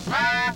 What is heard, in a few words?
HELMET SOUND, Science Fiction; Mower Man